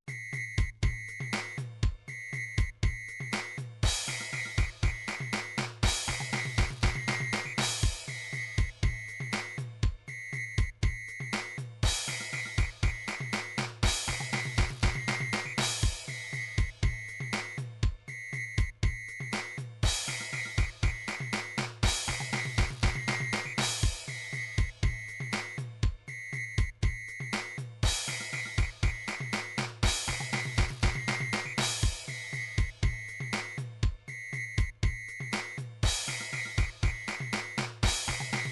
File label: drums, midi drum patterns